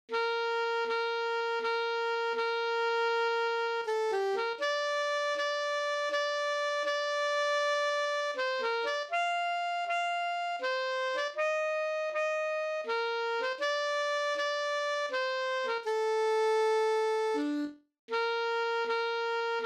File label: alto sax